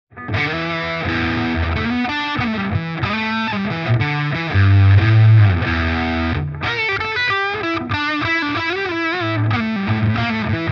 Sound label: Berkeley Bite max. Bright Switch off; JHS Pedals